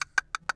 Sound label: squirrel; Tony Hawk's Project 8; XBOX